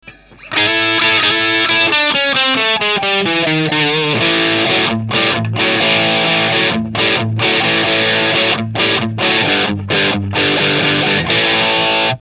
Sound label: jcm B